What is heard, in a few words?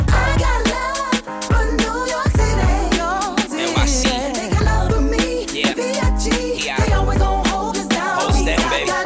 funk; multitrax